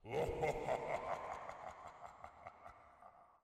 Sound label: laugh evil; angband